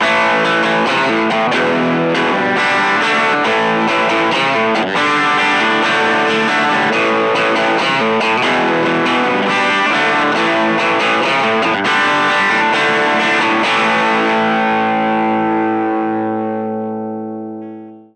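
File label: overdrive mid, guitar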